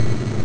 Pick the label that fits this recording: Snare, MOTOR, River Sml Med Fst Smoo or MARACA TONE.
MOTOR